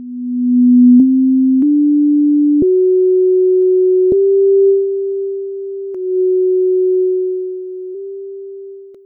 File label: puretones; sound of two electrons clapping